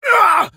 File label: grunt